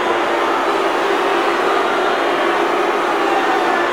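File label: Basketball Crowd Boo Loop; Crowds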